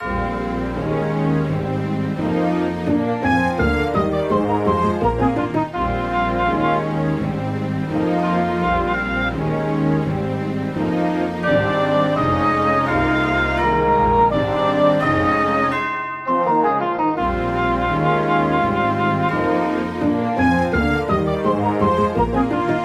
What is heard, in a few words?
Classical